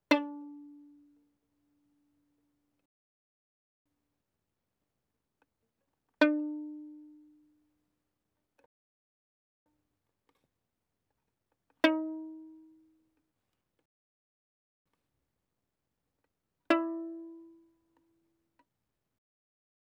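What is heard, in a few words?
Violin pizzicato fortissimo sul D D4 Ab4; Strings; violin2012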